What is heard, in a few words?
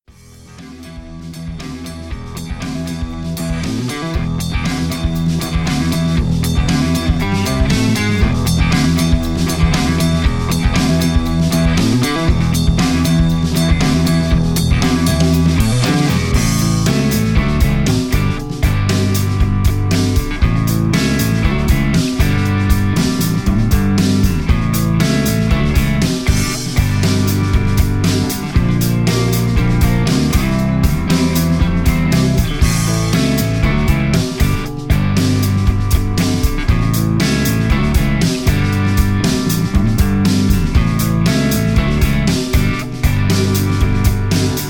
instrumental